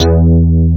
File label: Dance Bs